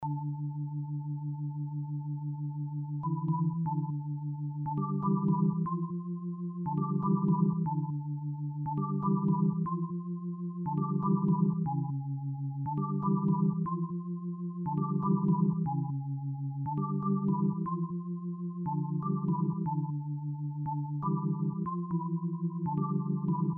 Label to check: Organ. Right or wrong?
Right